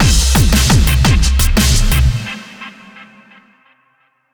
DramaticEvent; Randall; PS4

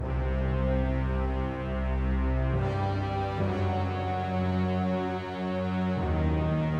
Synth; Metal Tales Fury of the Guitar Gods

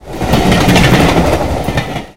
Ambient Steam train passby; talking tom gold run

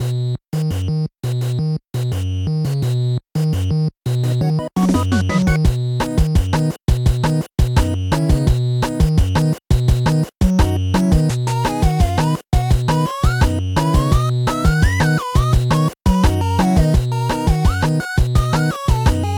Trade Area Loop; bat boy; ps4 ps5 switch windows xbox one xbox series xs

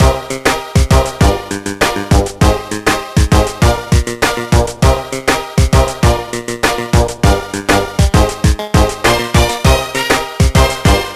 Rhythm Minigame (Training); ever girl; gba gamerip 2005

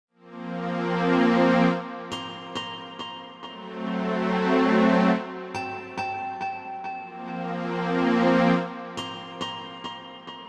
Substory Music; yakuza kiwami unreleased songs ps4 gamerip 2019

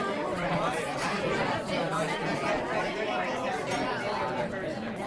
room ambience; Birthday Party Bash; Wii

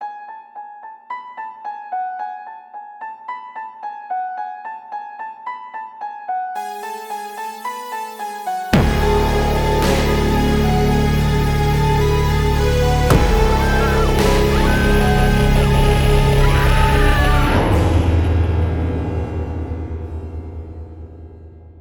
Piano Short; linux macos ps vita ps4 switch windows xbox one; gamerip; the long reach

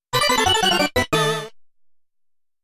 Jingle - Start Bomb Defusal; Master Detective Archives Rain Code

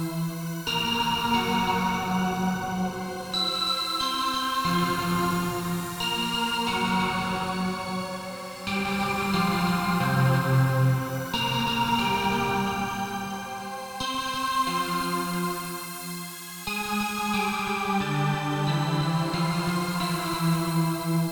Synth myst; Toki Tori 2

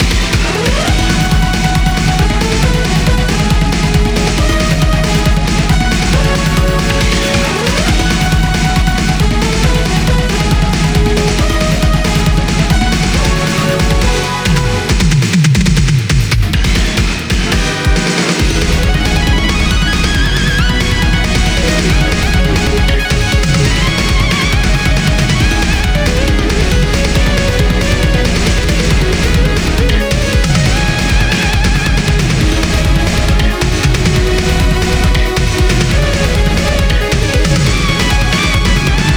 Final Battle Loop

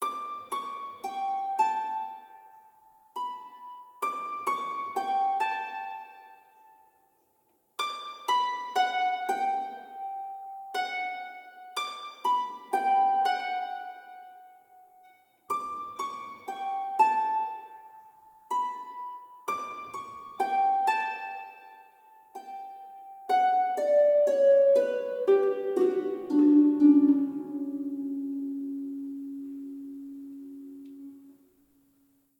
House Boat - Ambient, Harp Stem; ps4 ps5 switch windows xbox one xbox series xs

